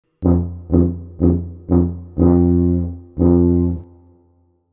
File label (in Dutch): tuba ritme